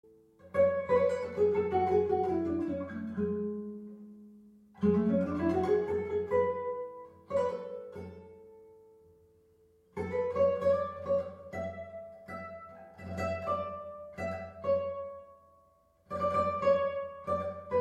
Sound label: Interlude; MIUI12